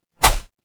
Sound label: paper fabric cloth whip